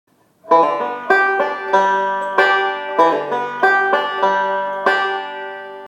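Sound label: lick 3-2 pull-off variation, banjo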